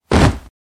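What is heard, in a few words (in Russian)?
zvuki padenii cheloveka, human falling sounds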